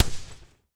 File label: bang small, explosion; Terrarum